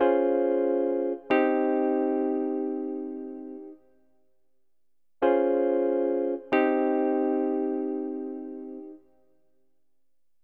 pno Ab, Hip Hop & RnB; Tantrum

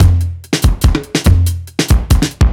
Heavy Swing 95 C, 95bpm; off the grid

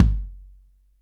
SLAMMER, Percussion; InVision Interactive

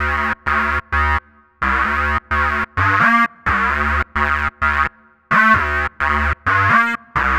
Synths, Underground UK House & Electro

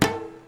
ETHNIC HIT, ASIAN PERCS; Crypton - DrumBase5000

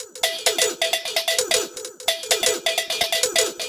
130 Tinny Break Perc, Drum Loops; Zero-G; Soundclash Nu Dance Electro; Acidized Wavs; 130bpm

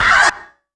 Yamaha CS1x Drums

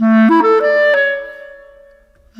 Clarinet; Sonic Foundry (Sony Creative Software); Orchestral Series VOL-4; Rock and Pop; Rock-Pop 05

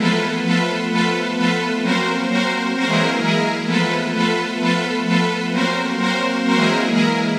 NRG Pad

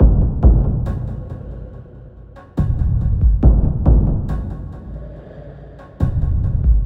SloMo Beats, Loops 140 BPM

